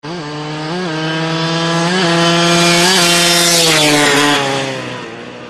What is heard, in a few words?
Dirt Bike Passing